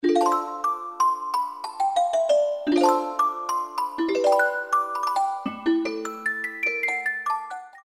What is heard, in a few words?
Celst, Yamaha TG33